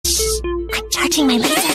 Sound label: charging my lazer; taunts; stompinggrounds; props